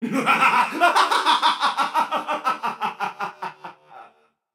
laugh, voicepack; smite; jorm

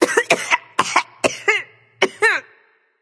clear throat; smite; nemesis

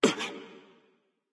grunt hurt; smite; thanatos

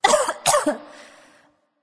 clear throat; smite; nox

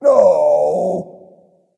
sigh; Smite; cabrakan

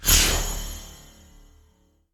basic projectile; smite; chronos

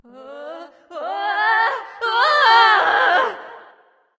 fear; smite

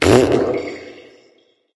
tongue light; smite; voicepack; hel

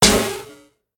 Bounce; smite; raijin

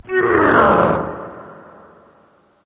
grunt lift; Smite; awilix

